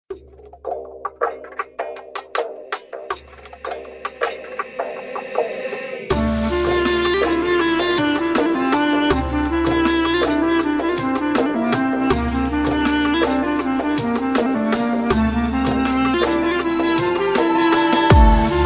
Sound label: remix remix